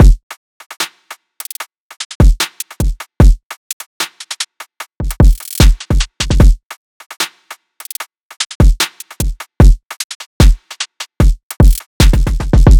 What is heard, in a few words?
Drill Drums